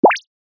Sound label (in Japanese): ぴょこ